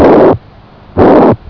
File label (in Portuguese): regurmitral soproholosistolico